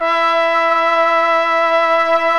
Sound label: ORGAN & BRASS